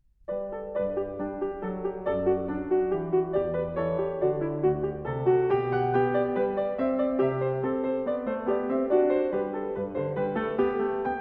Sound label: Goldberg Variations BWV 988 Variatio 4 a 1 Clav.; KIMIKO ISHIZAKA; Variatio 19 a 1 Clav.